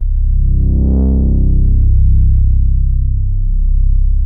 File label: OBERHEIMS